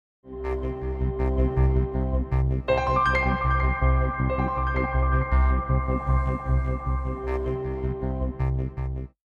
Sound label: Synth Piano Intro